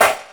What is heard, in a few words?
SD, Drum & Bass, 140 BPM; Ueberschall; Drum & Bass Flash Trackz